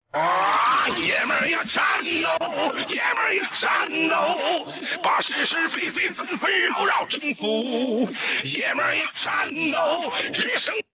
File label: Vocals